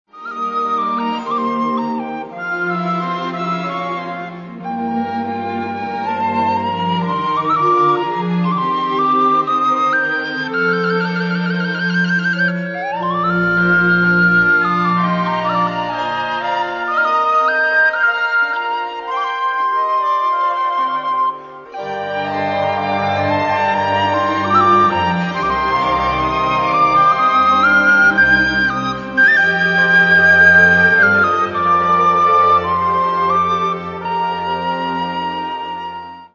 sopilka

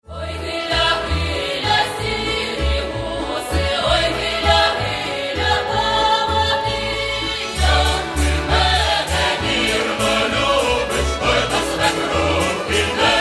Folk Choir; Cherkasy

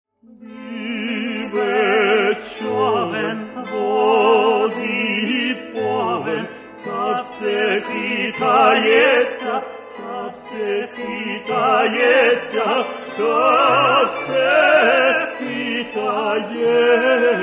romantic songs

